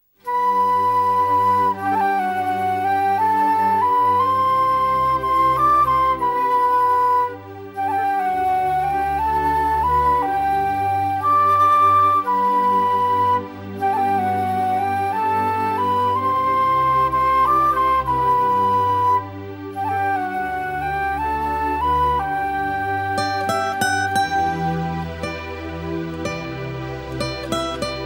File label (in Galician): marcha procesional